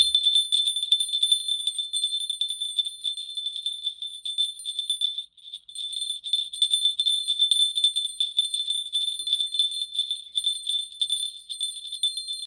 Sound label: small petal bell